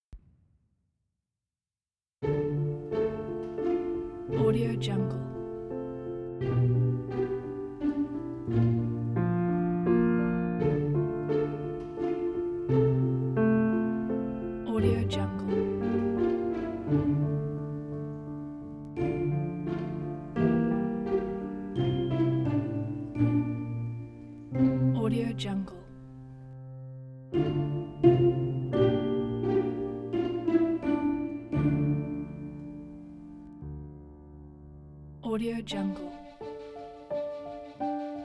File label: cinematic ukrainian lullaby